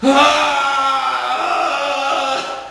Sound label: stun; billy herrington; slender